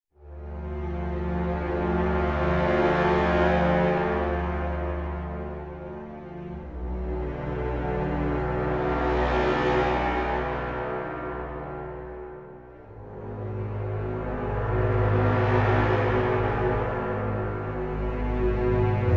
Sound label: el train mood; inFamous; PS3